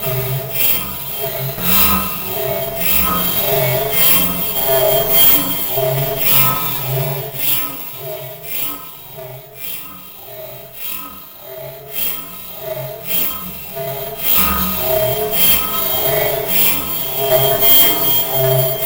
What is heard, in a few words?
Psycho Groove; Sonic Foundry (Sony Creative Software); Loop Noir